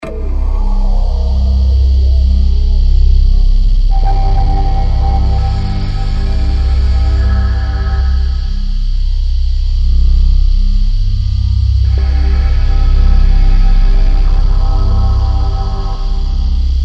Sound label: Drone Executioner; 5.1